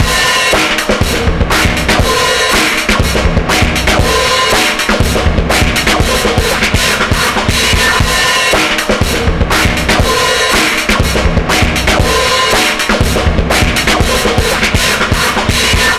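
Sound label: drum 120, Wah Wah 120bpm, Breakbeat; Best Service ProSamples vol.40 - Breakbeat 2; And Wah Wah 120bpm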